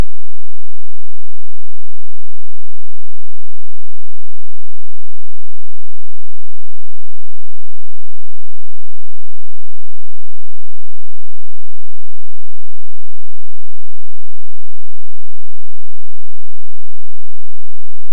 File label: bass lead